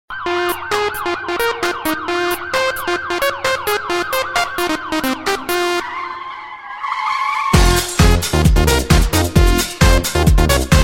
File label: Short Tone